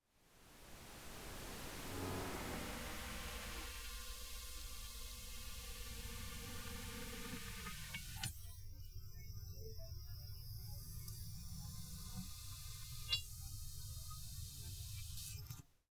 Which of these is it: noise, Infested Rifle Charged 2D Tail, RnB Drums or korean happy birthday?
noise